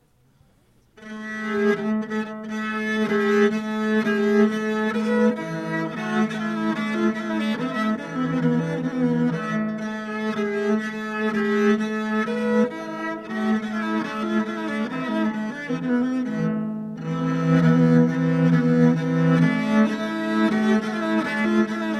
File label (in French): Interlude viole de gambe